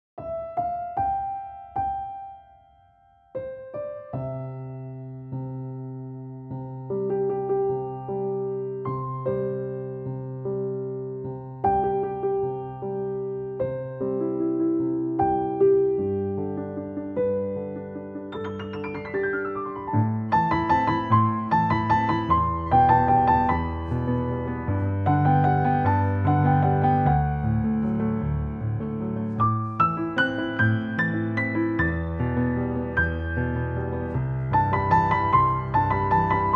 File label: (Thème Au Piano); Rémi sans famille (Bande originale du film)